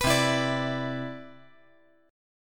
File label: C Major 9th, C, down, chord